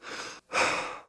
sprint breathe, police

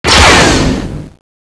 lightsaber hit

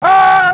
grunt pain; hgrunt2

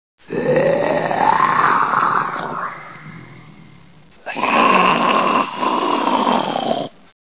mutants, ambience

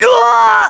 pain; krillin

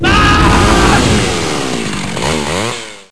chainsaw scream

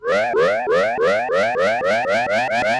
laser load

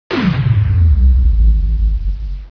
slow motion on

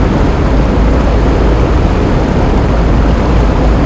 pipeline big pipes loop

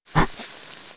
pl burn pain, player

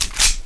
colt clip in

